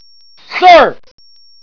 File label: gate